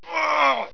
trooper pain

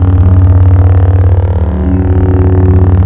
lightsaber idle

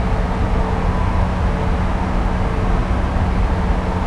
industrial

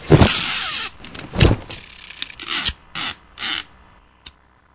bandage apply

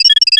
mine activate; diekrypta

